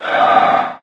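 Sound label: Movement Breath Out; smite; god bakasura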